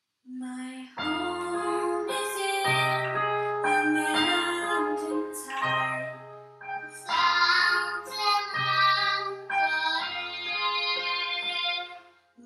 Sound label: With Piano